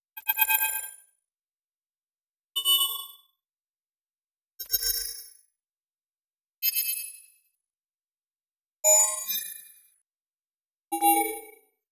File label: Electro Periodic Ultra High String